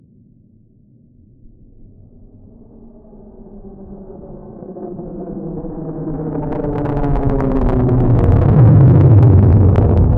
a drone flying by

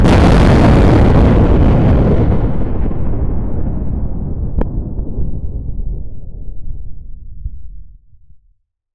a volcanic eruption with a thunderous explosion

